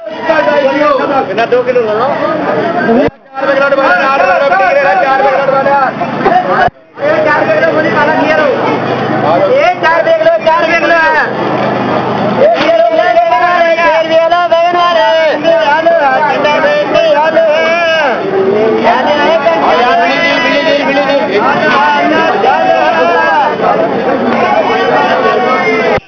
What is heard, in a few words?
dehli market